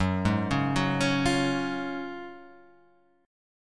Gb Major 7th Suspended 2nd, down, Guitar, Half Step Down